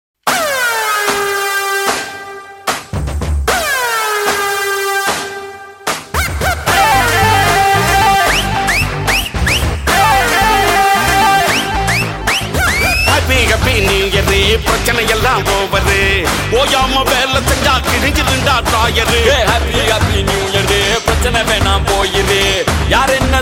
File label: Ringtone